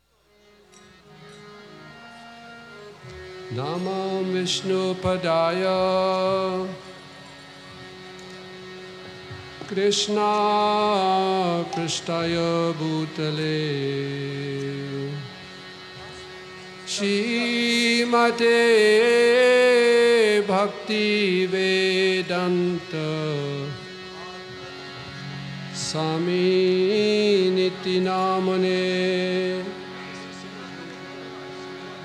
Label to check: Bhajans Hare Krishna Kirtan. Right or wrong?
Right